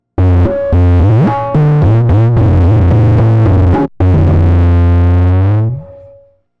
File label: tabla jomox